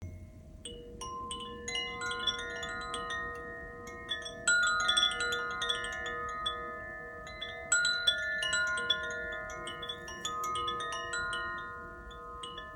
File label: Green Wind Chime; wind chimes green for spring